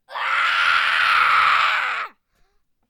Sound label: Scream